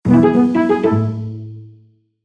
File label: quest completion; Minecraft